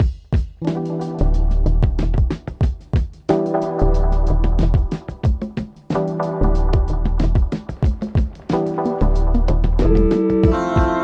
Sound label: wail drop